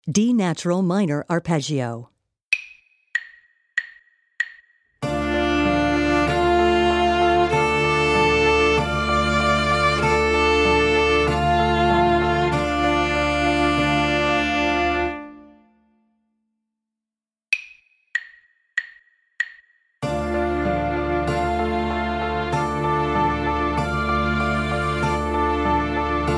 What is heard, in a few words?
D Natural Minor Arp